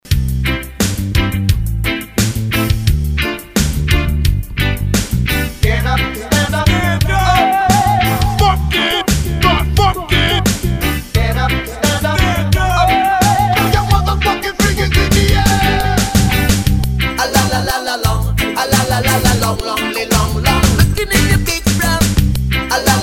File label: Classic 90s Reggae Hype ReDrum